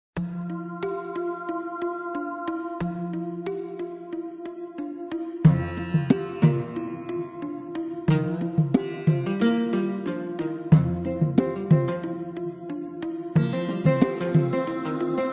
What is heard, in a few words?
Raag Trance